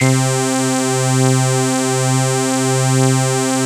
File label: C3, synth line; 90 s Sample CDs; NiceBeats; SynthLine VOL-3